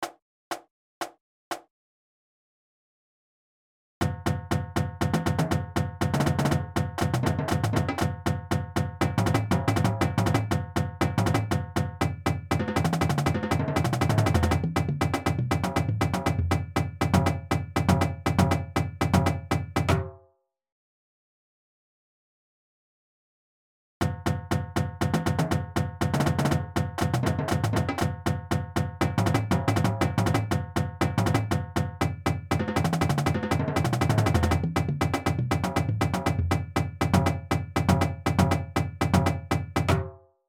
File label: Drumline Warmups